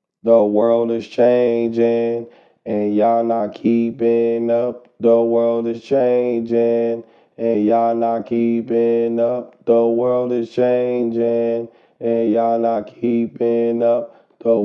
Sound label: edm 98 D sharp, Acapellas